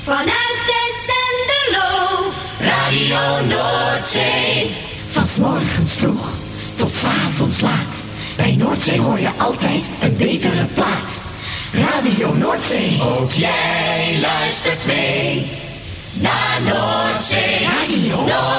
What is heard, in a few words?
jingles; NOORDZEE Tessenderlo